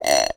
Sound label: Bolt Glass Scrape, Medium Wine Glass